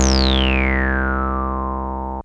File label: TB303 SQR, ROLAND TB-303 BASS